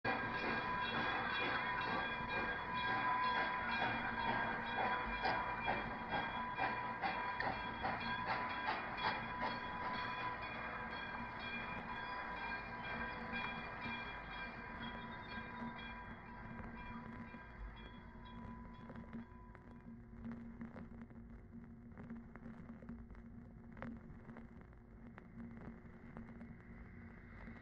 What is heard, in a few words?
Steam Sounds, Steel Rails, switching; H-9; LI City